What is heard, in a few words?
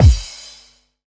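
bass drum FX, dance drums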